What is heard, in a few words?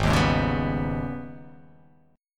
Ab Minor Major 11th, AbmM11 chord; strummed; JGuitar